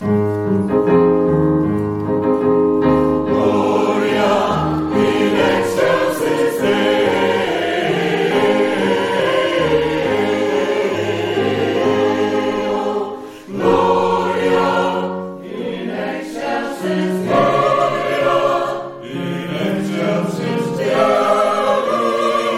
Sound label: Fanfare